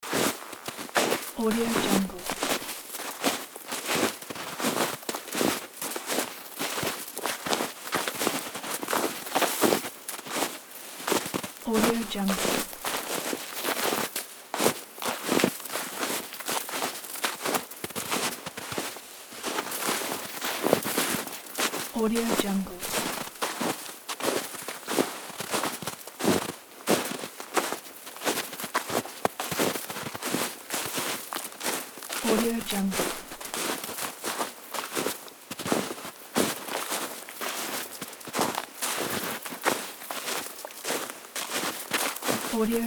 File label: walking in the snow